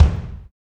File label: KICK, Tekno Industrial; East West